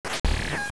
Sword Combined; zelda

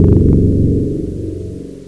power down

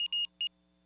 eng beep 3x3; trek

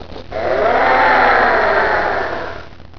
alien

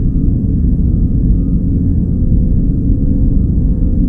ent interior; trek

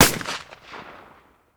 rifle

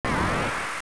Boss Scream; zelda

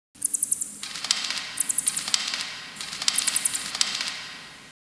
cave

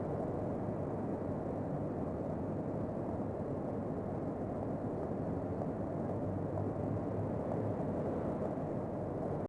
subway

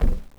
bump

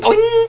boing

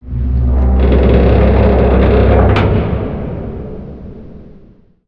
ship creak low; trek

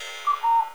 cuckoo

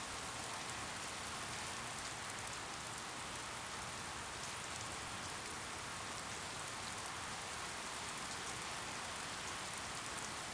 rain ambiance

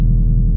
hum

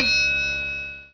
elevator ding

